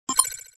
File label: button click